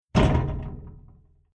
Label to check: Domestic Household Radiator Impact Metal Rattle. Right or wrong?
Right